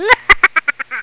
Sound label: LAUGH